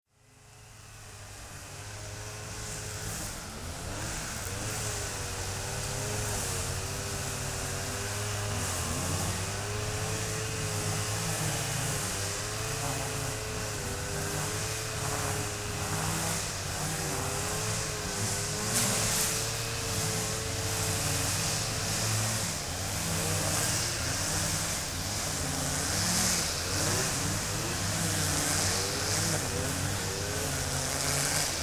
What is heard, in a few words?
trimmer